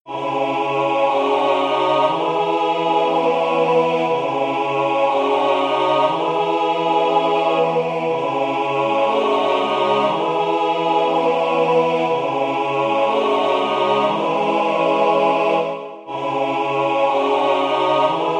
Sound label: MIDI SATB acappella